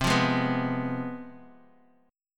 C Major 7th Suspended 4th Sharp 5th, C, down, Bass Standard; strummed; CM7sus4#5